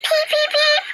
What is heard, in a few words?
baby blue bird peep peep peep